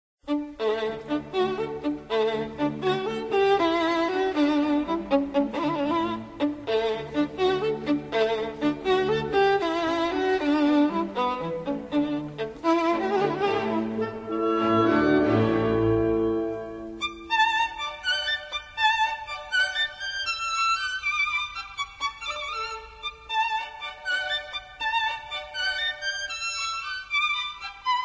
Concerto pour violon et orchestre 3e mouvement Theme; Beethoven

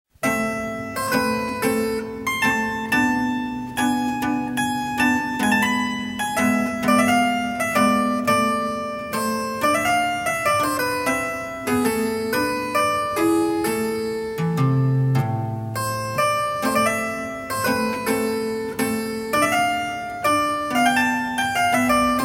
Sonate pour clavecin; Domenico Cimarosa

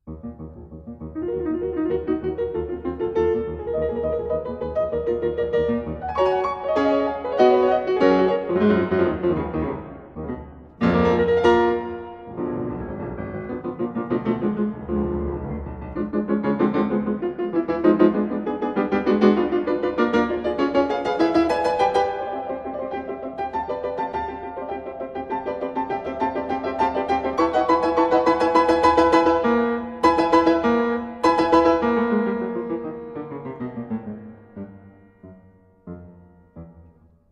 Pieces lyriques pour piano; Puck; Edouard GRIEG

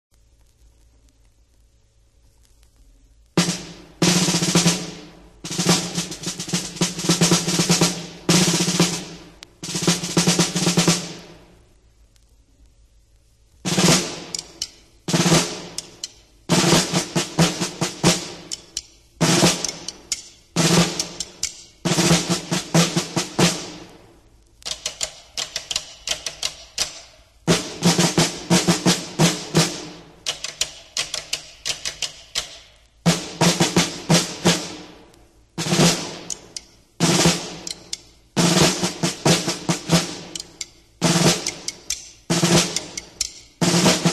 Caisse claire differentes fonctions Percussions

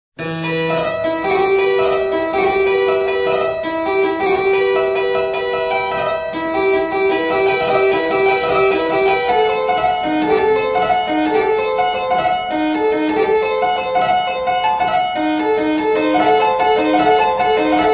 Cordes pincees Le clavecin; Le rappel des oiseaux; Jean-Philippe Rameau

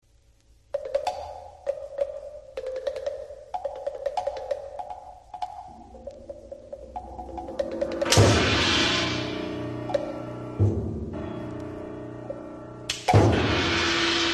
Percussions; Un cheval passe; Pierre Gabaye

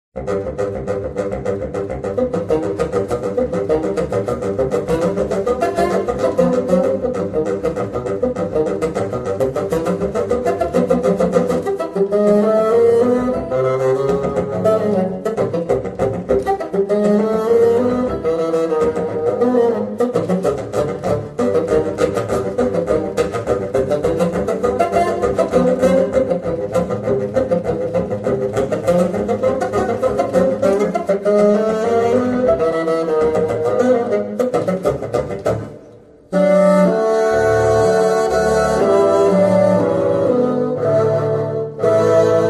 Pour deux bassons; Humorous Scherzo; Serge prokofiev

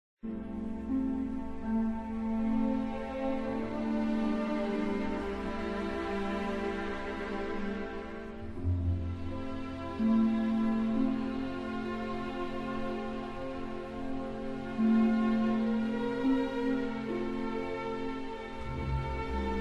Symphonie; Adagietto; Mahler

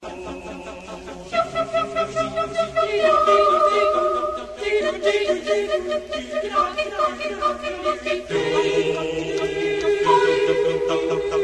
Le chant des oiseaux Le rossignol; Clement Janequin

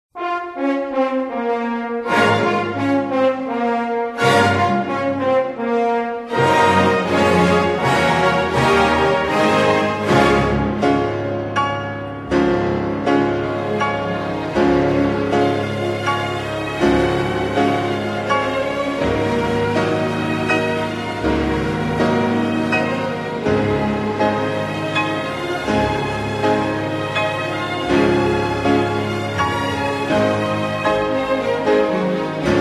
Concerto pour piano et orchestre Theme; Tchaikovsky

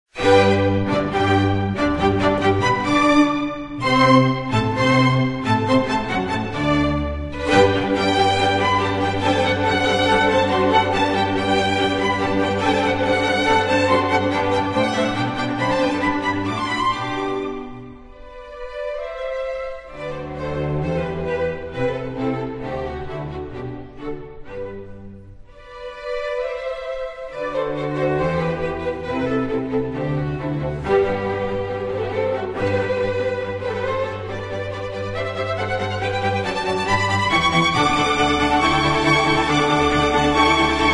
Serenade; La petite musique de nuit; Mozart

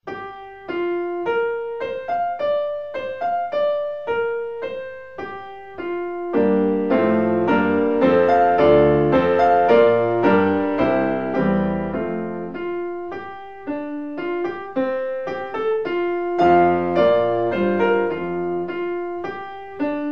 Tableaux d une exposition Promenade 1 piano; Modest MOUSSORGSKY

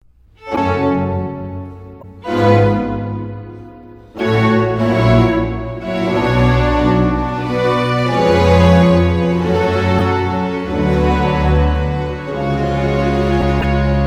Vivace et Grave; 1er mvt; Arcangelo Corelli

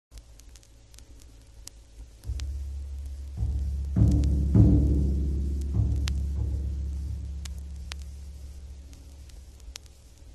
Grosse caisse Percussions

